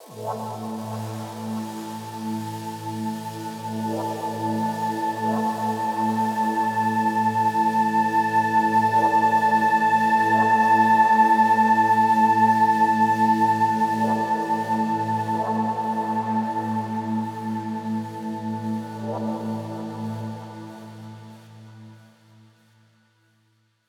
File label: 95 Am ATMOSPHERES Tail